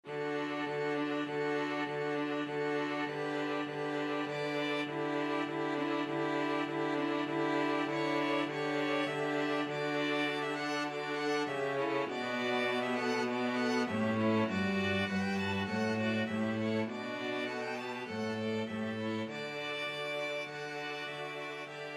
STRQ, string quartet; bach sheep

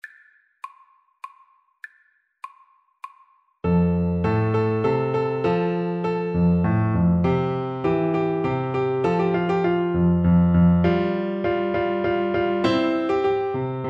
minuet, Bassoon

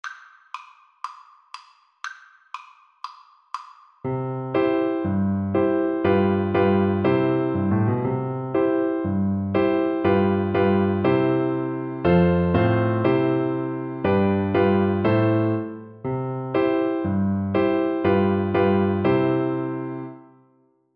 ten green bottles, Flute

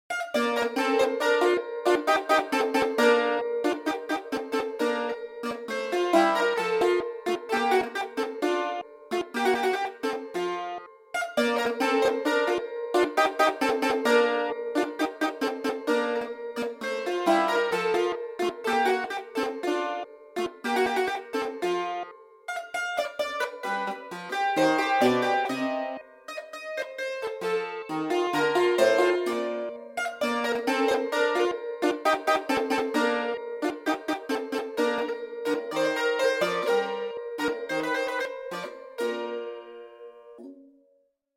allegro in b flat major, piano

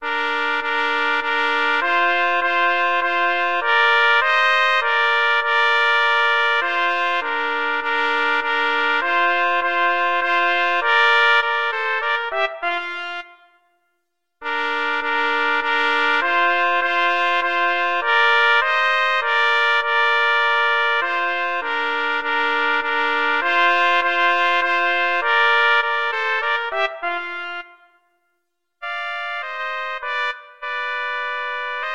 duos menutto, trumpet duet

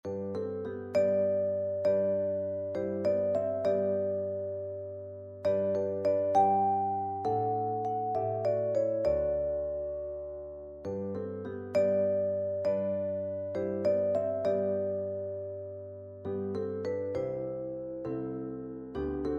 blessed assurance, percussion

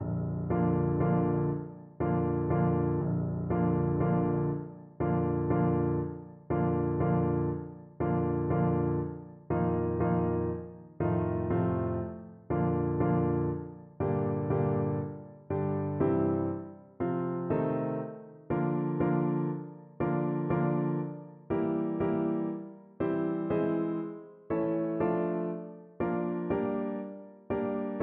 saint saens elephant, Tuba

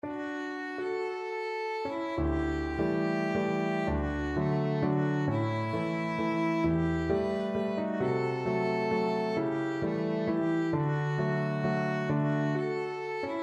waltz violin

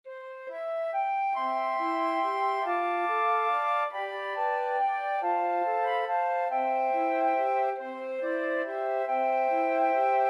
morning has broken, flute quartet

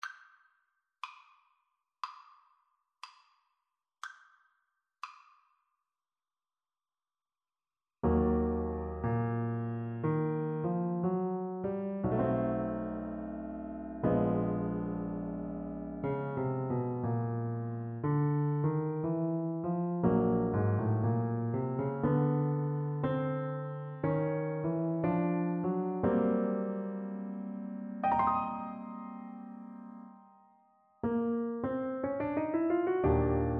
jazzy birthday, Clarinet

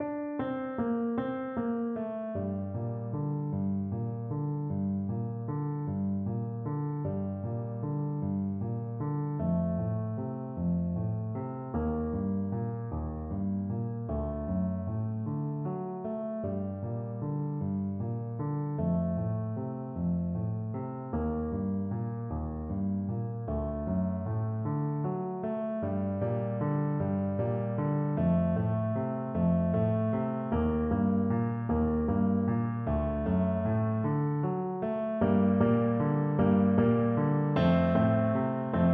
Spanish violin

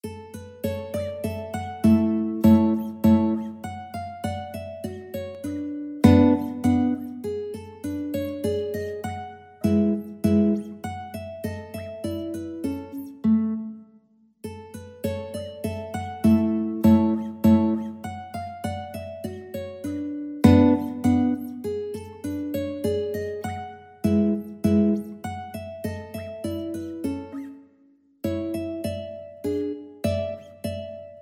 Waltz in A, mandolin; Schubert

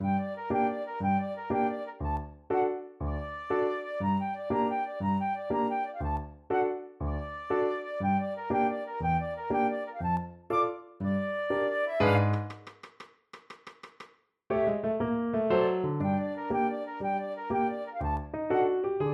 clog dance, flute

